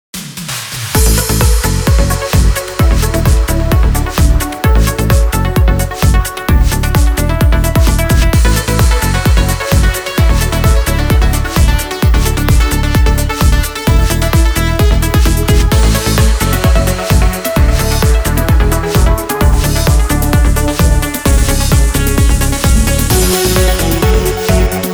INST

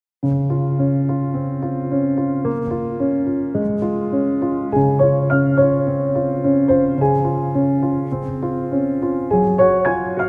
Piano Theme

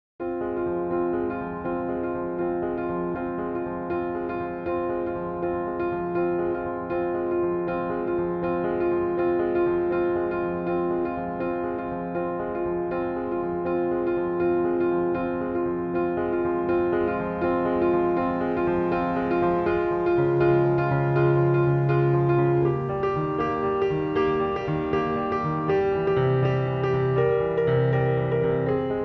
neon piano

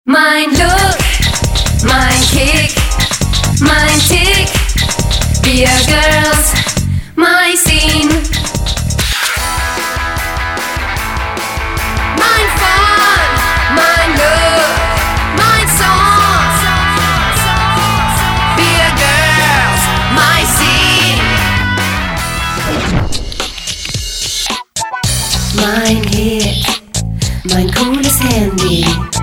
Jingles; Barbie My Scene

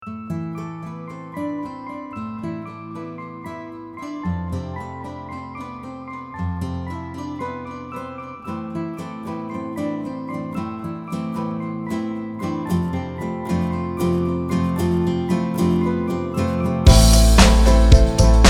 DRIVE

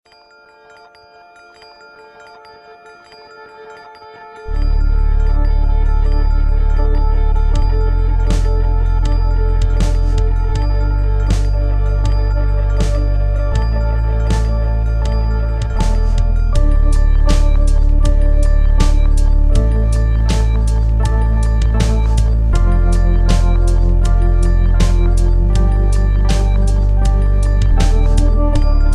xy loop

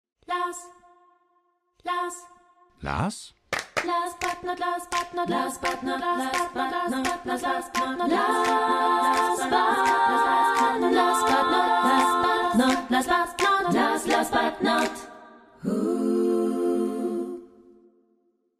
Song

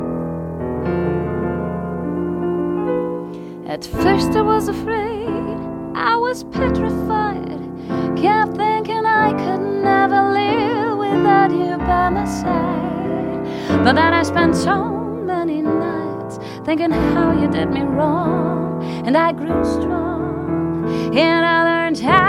Song; LOFT VOICES

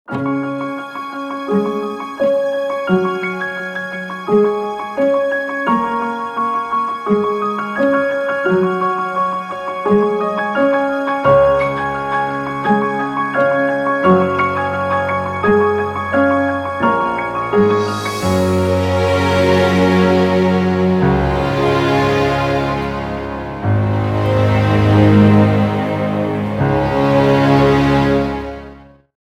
Waiting Line

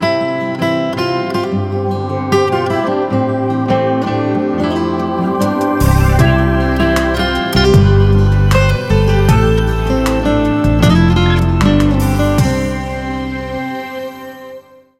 Easy guitar

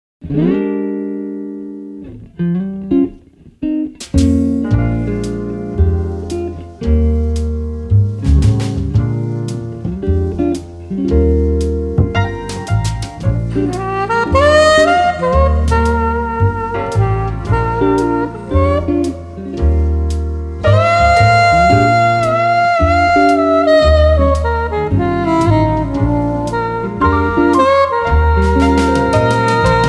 Sopran Tune

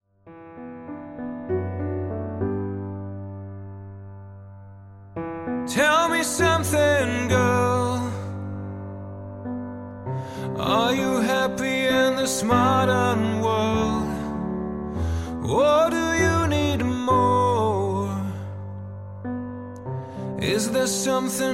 Song

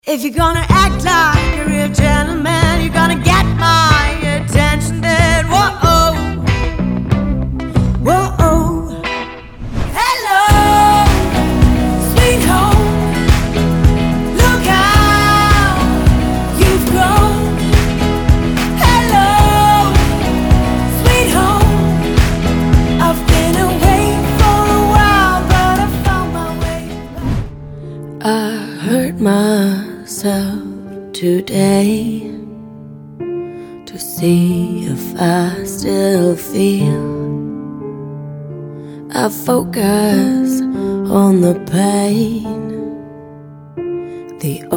Vocal Medley

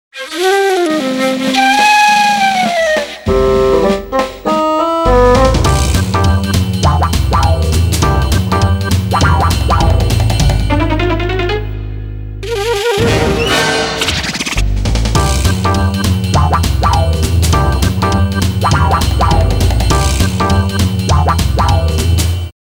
Wah Wah

